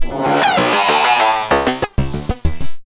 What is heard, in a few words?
fx maxed out; One Shot FX; Sample Magic; Transmission-X